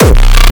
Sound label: Hardkick Punch; exciplex sample presets pack; JinoBeats